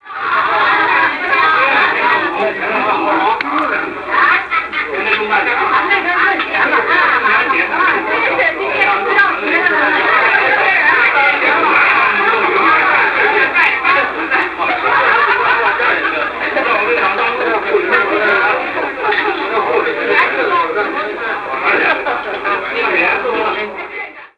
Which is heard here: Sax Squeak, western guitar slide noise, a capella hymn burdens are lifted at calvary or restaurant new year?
restaurant new year